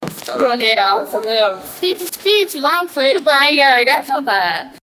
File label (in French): des gens mange le petit, breakfast